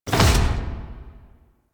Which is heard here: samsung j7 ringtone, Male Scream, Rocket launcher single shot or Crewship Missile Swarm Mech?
Crewship Missile Swarm Mech